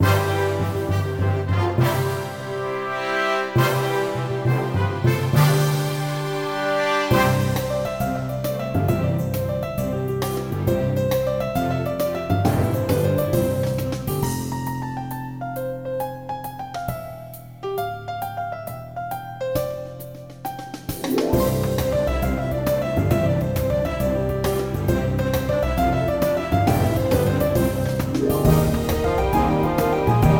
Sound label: Bonus; Bugdom 2